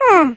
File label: mwah